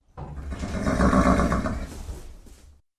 Gelding Horse Bray

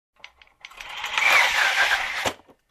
Cinema Film Rewinder

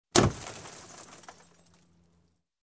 Car pane shattering dull

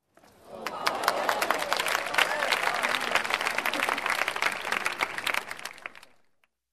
Dismay Crowd Applause

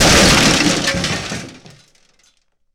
Metal and wood with debris smash

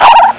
Exotic jungle bird

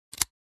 Opening lock

